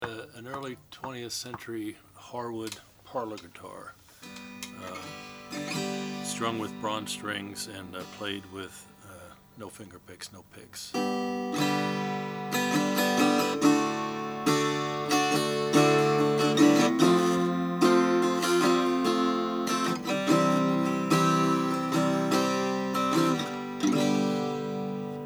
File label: harwood parlor; 1910; natural